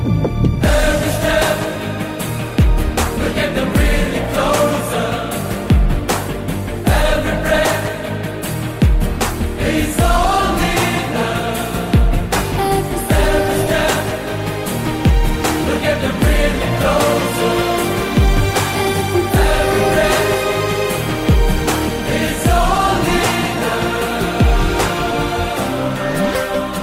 English Version Ringtone

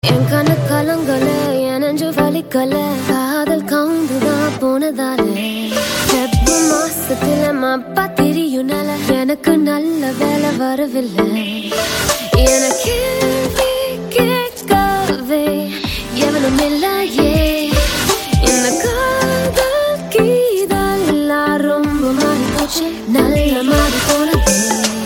Ringtone Female

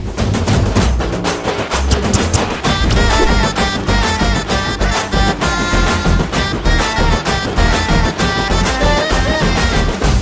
Drum Beats

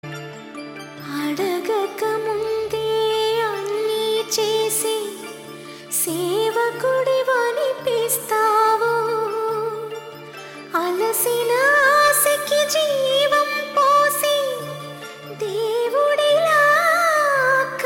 Female Ringtone

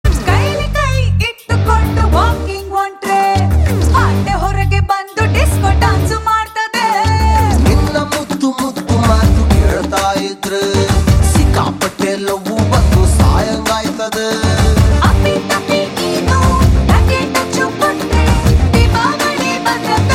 Female

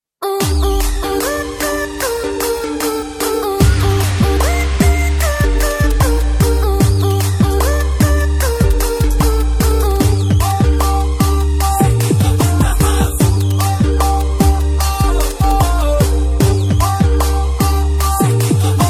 Telugu Ringtone

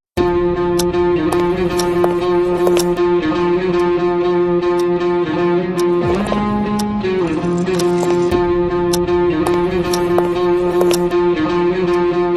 BGM Theme Ringtone; Disco Raja

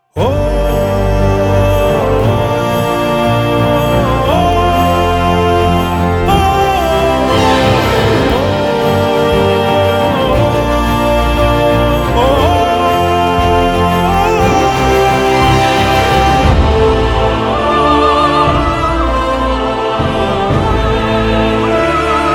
Love Bgm Ringtone

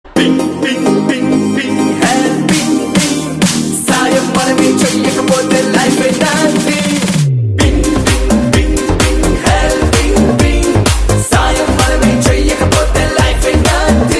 Ringtone

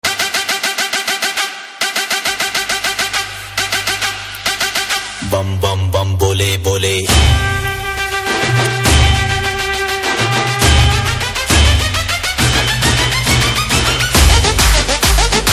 Theme; Laxmmi Bomb Movie

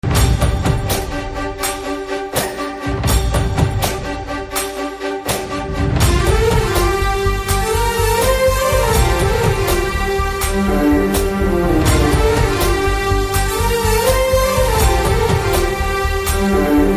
BG Tone

